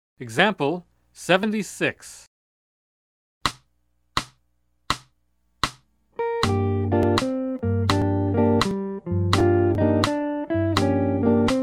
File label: Jazz; jue shi ji ta